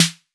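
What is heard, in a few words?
808 snare drum; Wizoo - Platinum 24 Electronic; CHR SD 808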